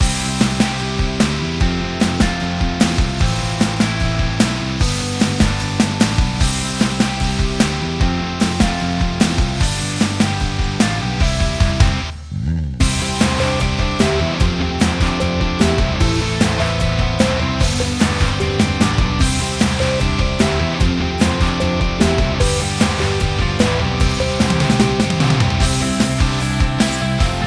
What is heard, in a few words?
Control Playmap Rhythmical Bgm